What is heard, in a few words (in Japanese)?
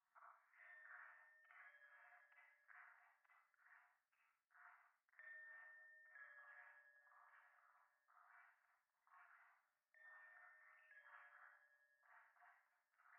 夢の中 フェラチオ 神社